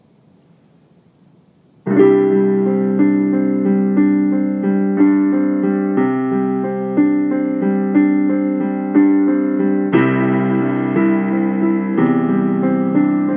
piano only

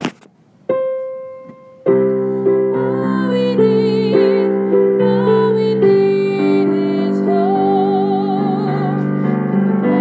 solo vocal

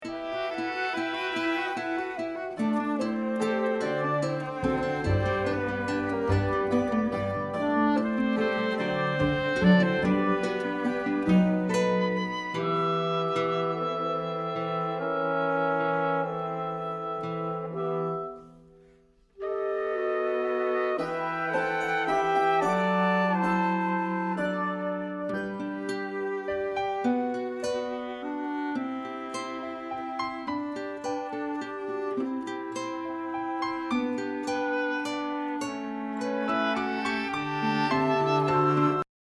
accomp

